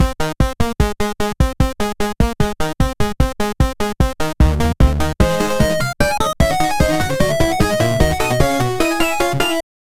upbeat energetic chiptune

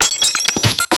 break plasma energy sound, plasma

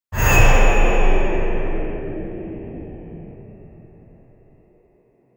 dark fantasy goblin getti

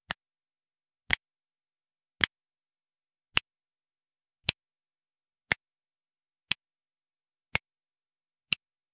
a soft click sound effect, cute

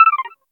satisfying ui decision sound; user interface

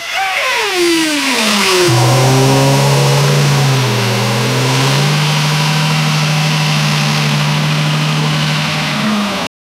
the sound of aggressive, muscle car

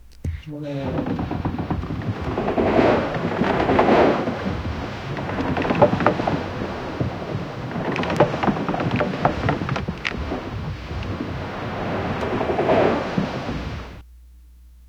a large wooden sailing sh, ships

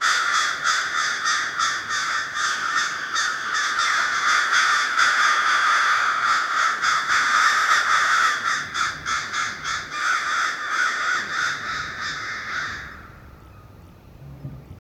cawing of crows; katan

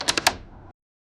windows pulse battery low, alerts

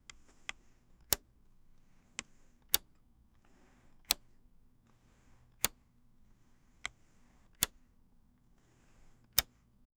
chunky button press and r

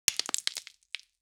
sound of a skeleton colla, bone crack

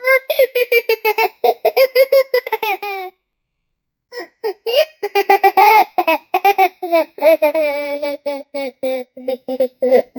classic with a childs lau, childhood

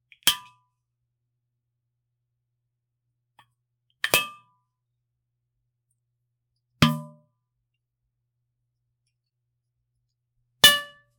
catching the falling soda, everyday actions

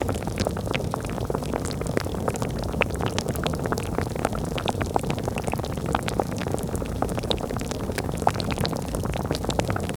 warm mud bloop thick mud; bloops

